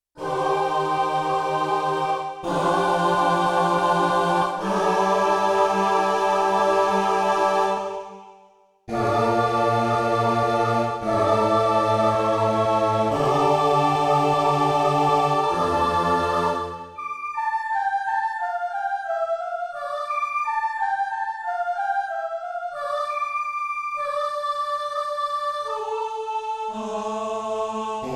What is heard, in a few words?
Male-Female Choir; Yamaha TX16W; Orchestral Performance 1